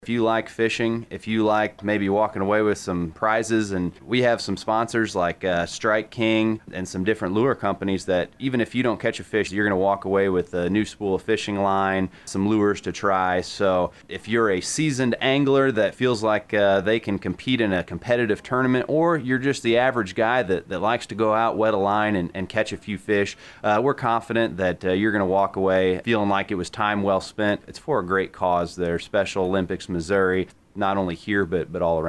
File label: bass